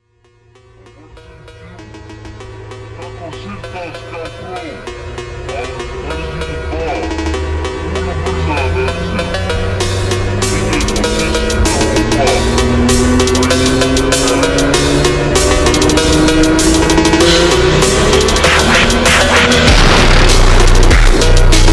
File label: extra slowed reverb